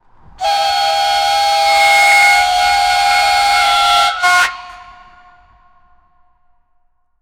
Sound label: a powerful and noisy ice, hockey